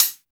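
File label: POWER closed hi-hat; Drums In Your FACE!; BONUS HATS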